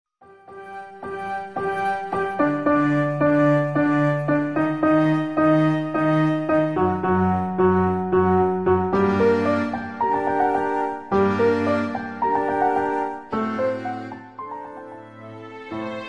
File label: pian orchestra